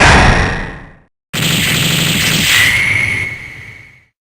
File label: attack; seth